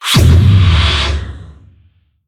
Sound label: Voodoo Push; we are the dwarves gamerip 2016